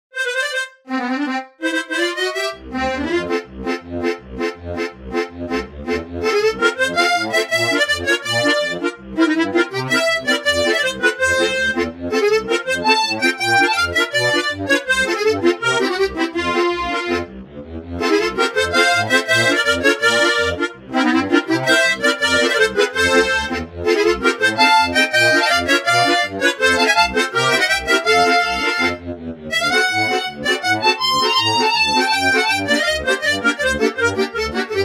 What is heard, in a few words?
accordion